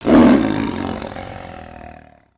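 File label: Sabertooth Howl; creatures; pets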